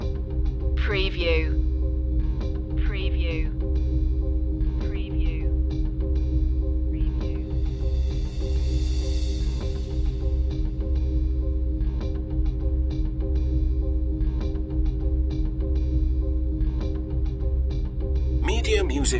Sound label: ion rise loop